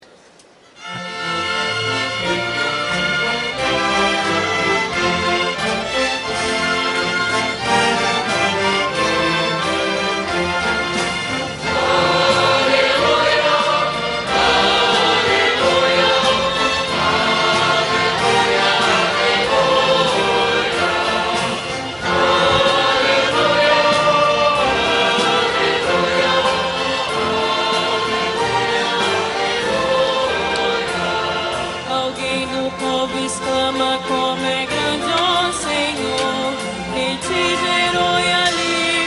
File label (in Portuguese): Aleluia; Alguem do Povo Exclama